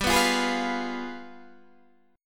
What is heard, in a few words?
G 7th Flat 9th, Guitar, Open D